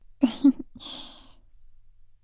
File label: laugh, ASMR; Garden; WithRin-Nazo01